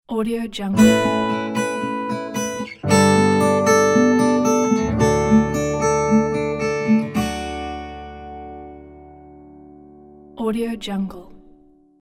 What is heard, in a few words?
mellow acoustic logo